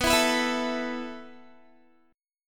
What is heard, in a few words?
B 7th Suspended 4th B, Guitar; B7sus4; strummed; 4E,4A,5D,5G,5C,6F; 19,19,21,21,21,x